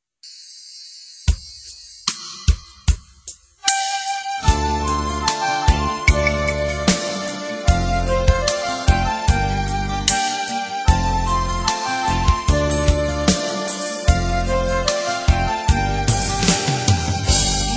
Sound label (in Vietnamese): nhac khong loi ly cay bong beat, Nhạc dân ca